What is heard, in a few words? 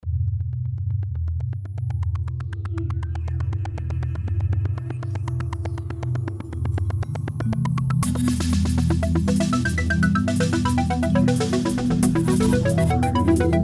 Synth Step